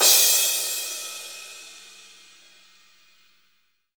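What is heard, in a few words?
cymbal crash; Best Service Gigapack 2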